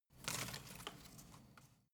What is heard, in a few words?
home plant leaves rustle soft